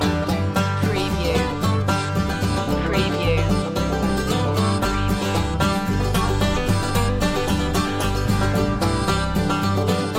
farmyard blues loop

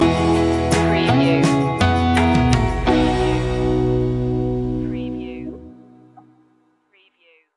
take it on home stinger

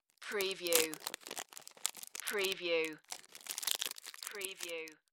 Power Bar Wrapper

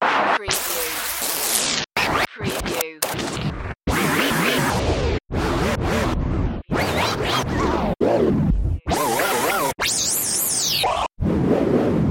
tape winding

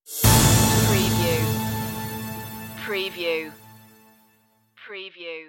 tv intense stinger

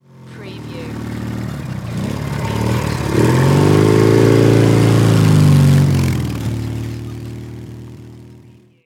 Motorcycle Pass By

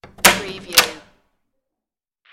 old door open

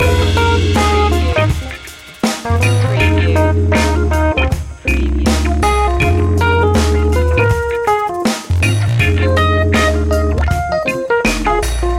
appealing loop

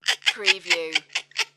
Evil Doll Laugh

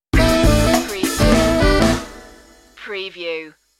funky stinger

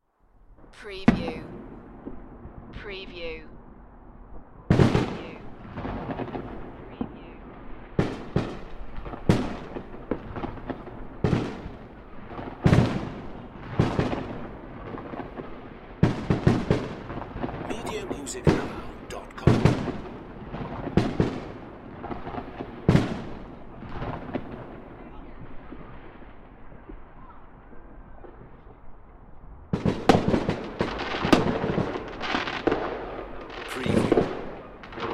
fireworks; beanstalk audio

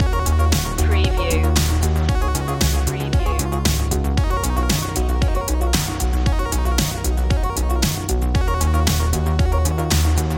retrowave loop

